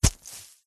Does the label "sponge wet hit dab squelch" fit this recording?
Yes